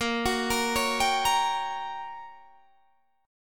G Minor Major 7th Flat 5th, A sharp

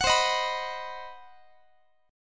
C Minor Major 7th Flat 5th chord, Guitar; CmM7b5; strummed

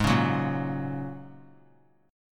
G sharp 7th Suspended 2nd Suspended 4th G sharp; G#7sus2sus4 strummed; 20,20,21,20; JGuitar

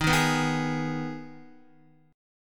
D sharp Suspended 4th Sharp 5th, Guitar; strummed

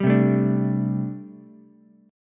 A Minor 11th A Ukulele C6, Chord; Am11 strummed; 0,0,5,5; JGuitar

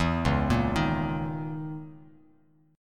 Db Minor 6th Add 9th Db down Bass Standard; Dbm6add9 arpeggiated; JGuitar

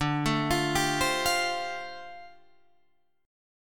D Minor 11th D down Guitar DADGAD

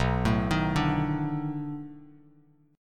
B 7th Sharp 9th, down, Bass Standard; arpeggiated

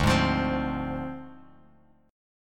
D Minor 7th Flat 5th Bass Standard; Dm7b5; strummed

